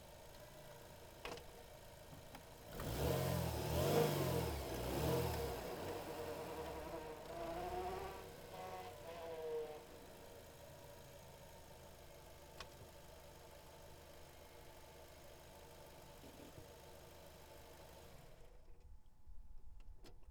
Driving in reverse gear engine bay, Honda Accord Type S; mono; Ivo Vicic